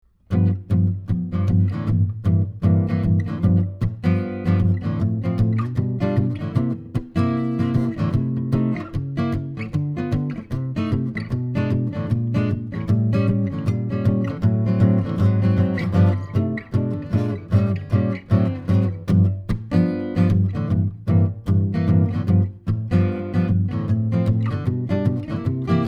Jazz

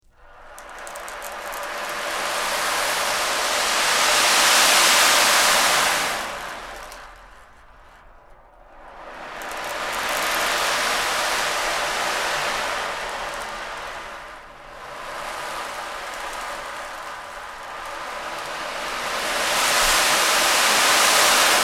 Rain

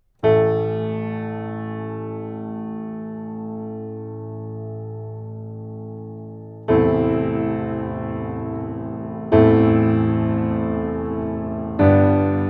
Piano

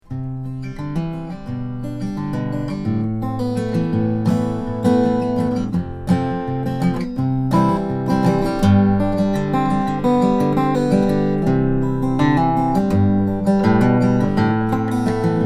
Fingerpicking

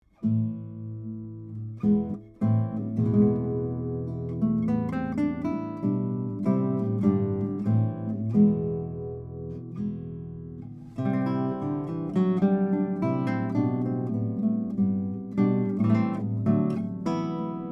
Warm Pavan, Neumann TLM107

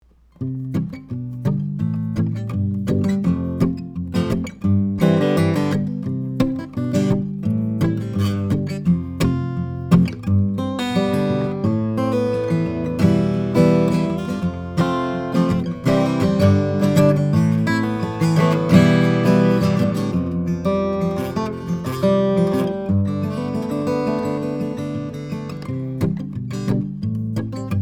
Finger Pk

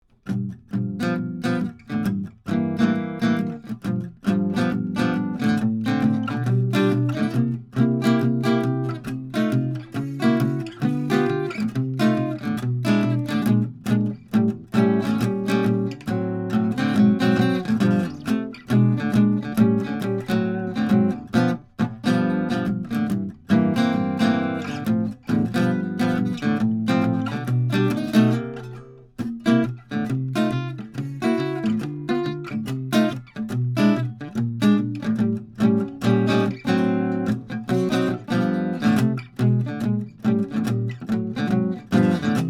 Jazz; Neumann U87Ai

